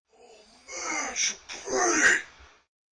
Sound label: pritty ringtone